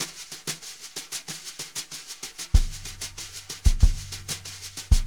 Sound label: BRUSH LOOPS, 95 BRUSH